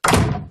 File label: door shut